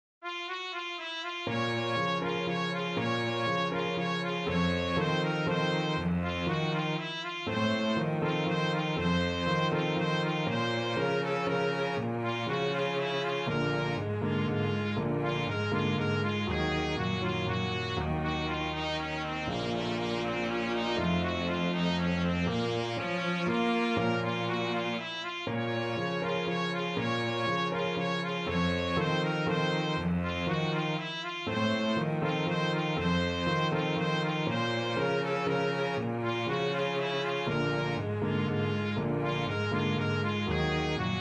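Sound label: trumpet cello piano, trumpet-cello duet; la partida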